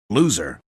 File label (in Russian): loser, smeshnye zvuki dlia montaja